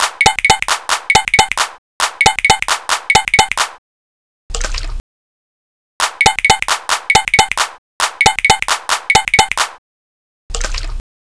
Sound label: sound pattern collage